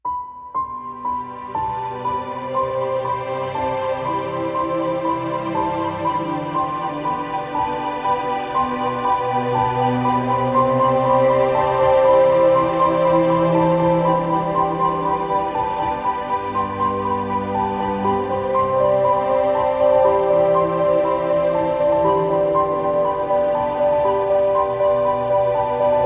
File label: Ambient